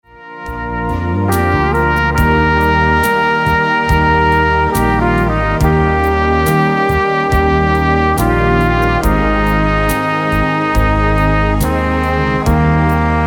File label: Amazing Grace Instrumental